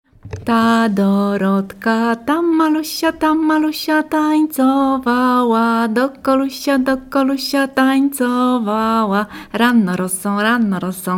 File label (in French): Chant; ABBAYE